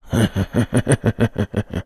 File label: human voice male laugh sly mean